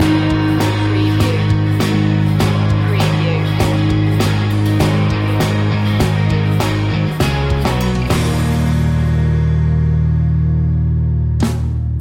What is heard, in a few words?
cloudy day loop